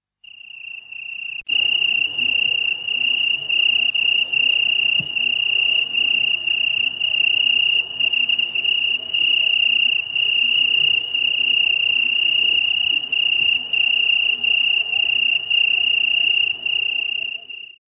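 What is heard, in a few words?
cicadas; tsykady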